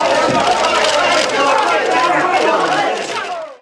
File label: angry questioning crowd